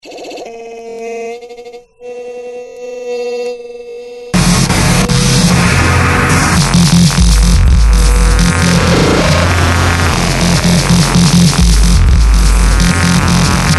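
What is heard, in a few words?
hip hop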